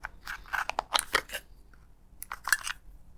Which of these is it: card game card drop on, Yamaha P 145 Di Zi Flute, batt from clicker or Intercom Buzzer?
batt from clicker